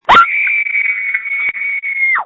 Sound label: Scream